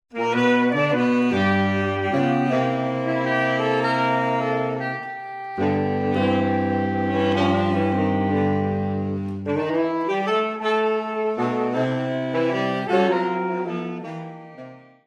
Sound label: blues, sax